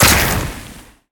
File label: large impact; smite; thanatos